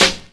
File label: airy sizzle snare f key; snare drum samples